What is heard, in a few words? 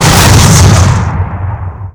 cannon metal impact